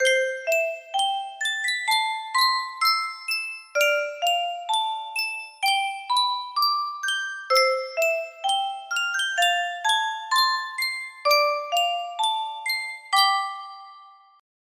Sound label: Yunsheng music box